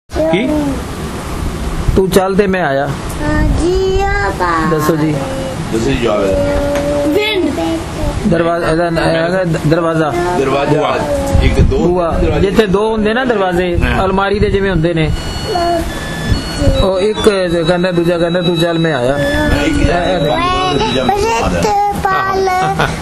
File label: Punjabi